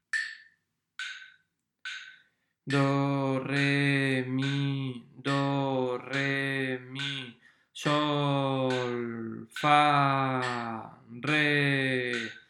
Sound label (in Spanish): RITMO